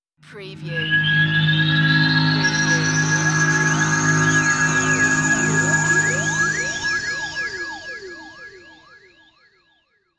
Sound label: Scifi Audio Logo Ident,Swirling,Gyrating,Hissy